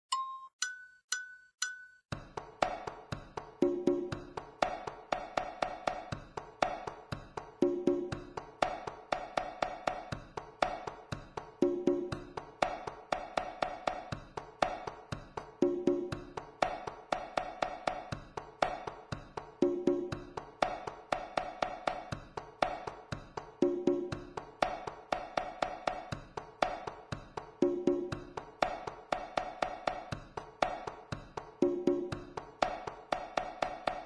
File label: left hand, congas; exercises; Laurent Lamy